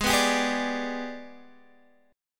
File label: G sharp Minor Major 7th Flat 5th, chord; strummed; x,11,9,12,9,10